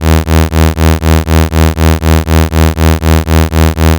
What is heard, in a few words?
am out, AM Modulation